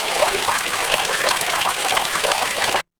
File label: water stream